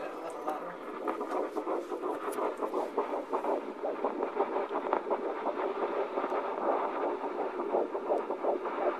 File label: heartbeat